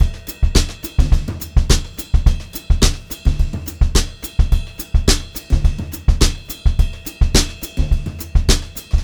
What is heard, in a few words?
HOTROD VARI, Brushed Drum Loops; USB Soundscan vol.39; AKAI; 90 s Sample CDs